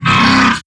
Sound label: vox pound, strider; creatures; combat; seq headbutt